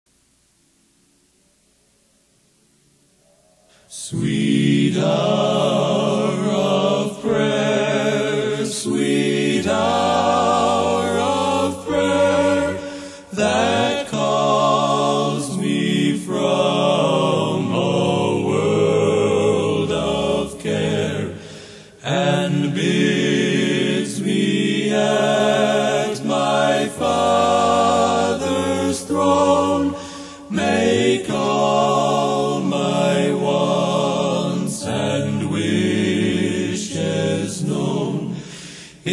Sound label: Old Hymns A Capella